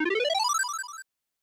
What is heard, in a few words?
Rainbow Bell Obtained; TwinBee: Rainbow Bell Adventure; SNES